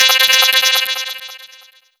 Sound FX Hi Tech

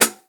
HiHat Foot; Lightning1024

